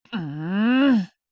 growl; Beatrice

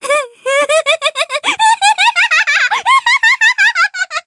laugh

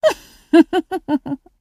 mhmhm; Beatrice

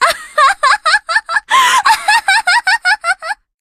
laugh

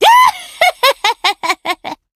laugh; Beatrice

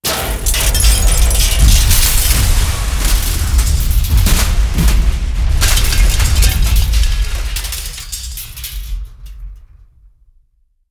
bomb

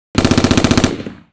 gun

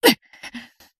gasp; Beatrice

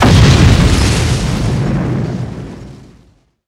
bomb

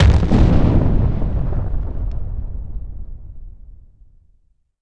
thud

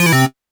beep

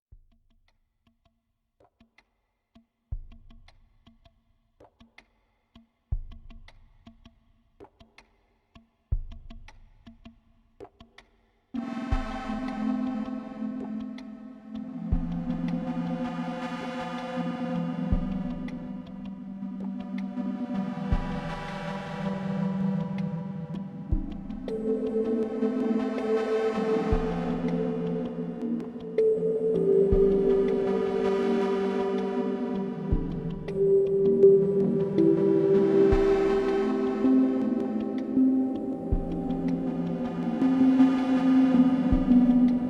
raindeer ride, music

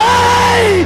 aieeeee

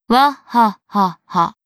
wahahaha; Ange

